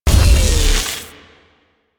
Turret Chain Lightning Close; Warframe; Zariman

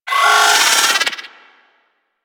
Drone Vocal Player Spotted; Warframe; Venus; Venus Terra Attack Drone

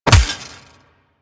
Melee Fist Impact Robotic Flesh; Warframe; Weapons; Fists

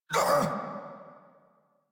Pain; Warframe; Eidolon Grineer

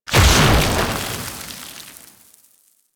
Fire Ball Impact; Warframe; Ember

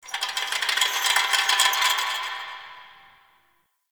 Crypt Chain Rattle Distant E; Ambience; One Offs; Champion Crypt

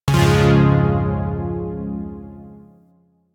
Shawzin Phrygian Chord B; Chords; Warframe; Zariman

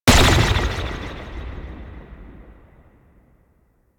Golden Band Staff Slam A; Warframe; Monkey King

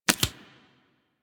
Tarot UI Card Click B; Warframe; Harrow; Tarot Emotion Choice

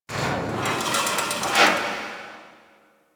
Corner Hall Rand Metal; Warframe; Ambience

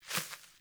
Sparrow One Wing Flaps

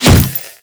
Glass Unbreakable Impact

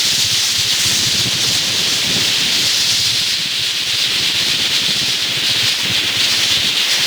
Gas City Heavy Blow Loop; Ambience; Props; Warframe; Corpus Gas City; Props Remaster

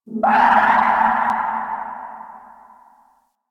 Night Distant Creature Small; Warframe; Ambience; Eidolon Plains; One Offs

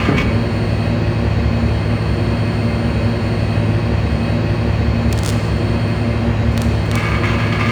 Vending Machine Idle Loop; Ambience; Props; Warframe; Nineteen Ninety Nine

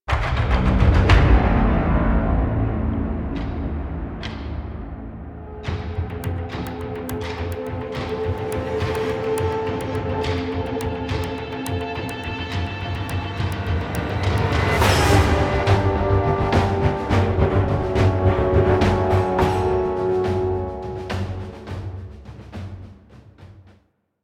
Amb Cue; Warframe; DE; Hubs; Syndicate Steel Meridian; Tenno

